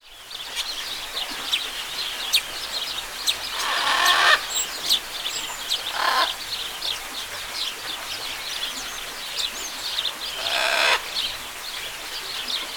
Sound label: jungle ambience